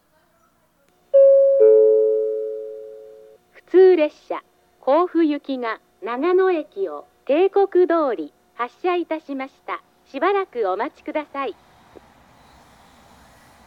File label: amori local, station; jr-east; kofu